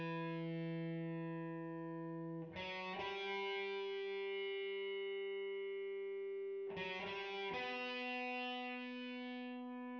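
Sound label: with drums synthetic convolutive 5cm; SASSEC07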